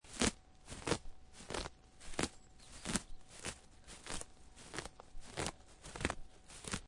Rip Grass From Ground, Grab grass and rip grass out of the ground; Several grabs pull grass, perhaps cow grazing; Ripping; Lynne Music